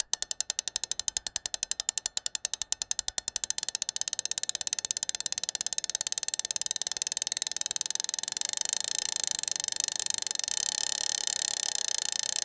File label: stylized roule, clicks